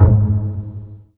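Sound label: bass pizzicato G#2, Virtuoso Strings; ILIO; Kirk Hunter Virtuoso Strings VOL-3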